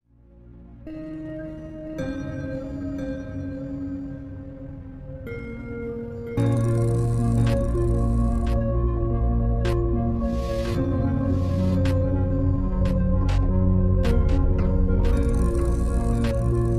Relax The Dreamy Atmosphere Electronics, superbass reverb slow